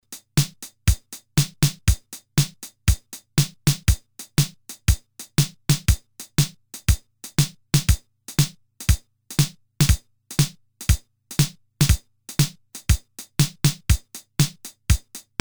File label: shuffle, roland cr1000; shuffle range